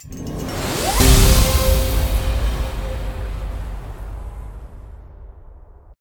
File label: Transition; everspace 2